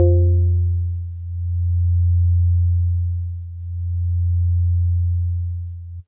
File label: Jazzy Organ DX100 F2